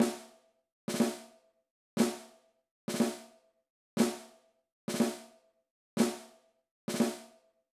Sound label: Snare Drum Exercise